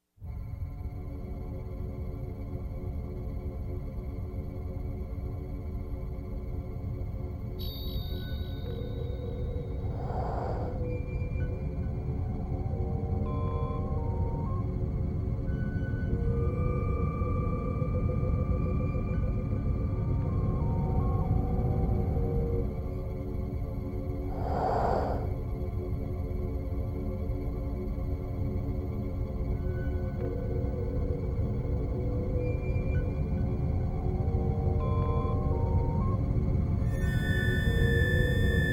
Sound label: Saucer